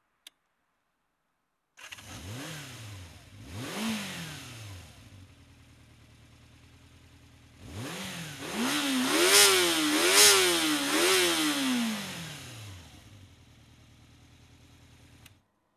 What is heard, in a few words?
acceleration, CBX